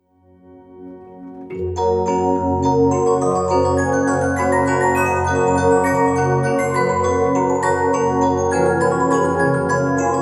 Voices of Spring Waltz, music box

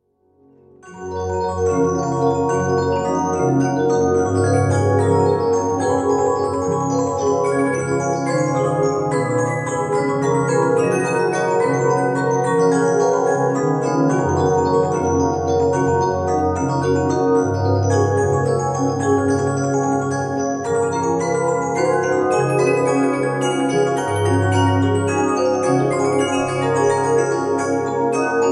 Waltz Of Sleeping Beauty, music box

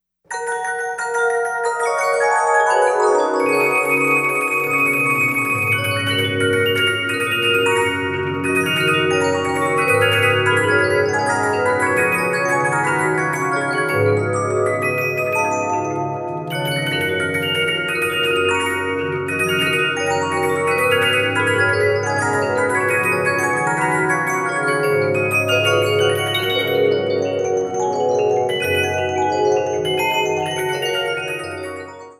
music box

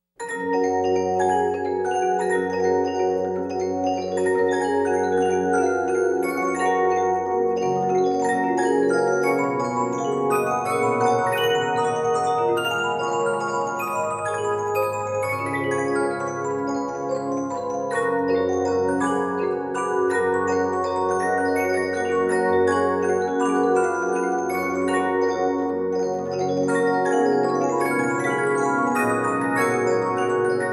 Mabels Waltz

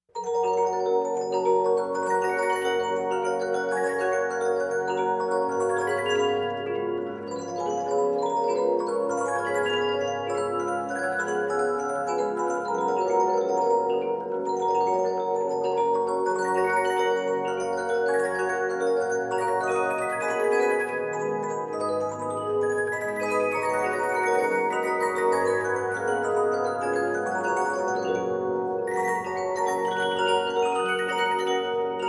Rippling Waves Waltz, music box

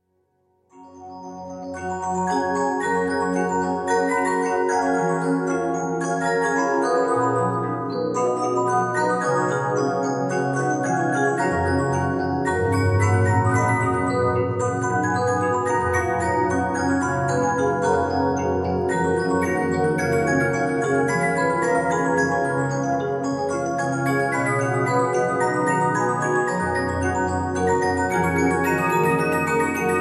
music box

